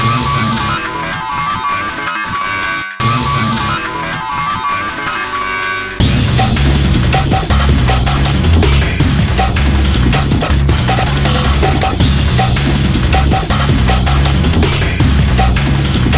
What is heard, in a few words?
NOISY NOISE; The World Ends with You Final Remix